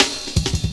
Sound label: Drum Loops, 165.5; Zero-G Jungle Warfare 1, 2 & 3